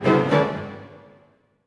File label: Orchestral Loops